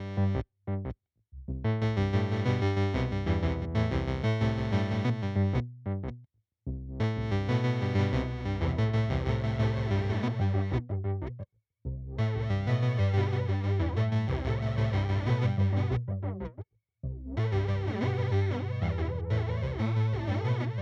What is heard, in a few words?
Walrus Audio Polychrome